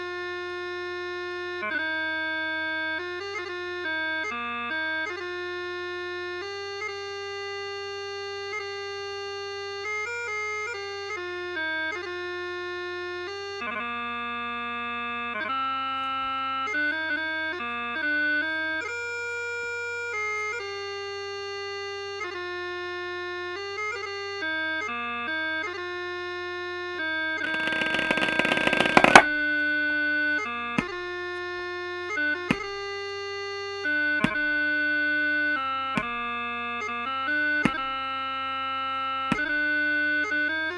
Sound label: Snare Chanter